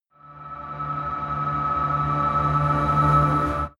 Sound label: mystical pad